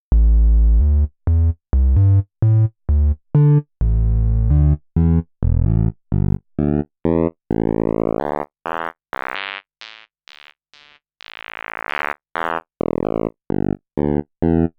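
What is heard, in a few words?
pwm triangle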